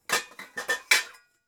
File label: pots handle